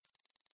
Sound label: hover skip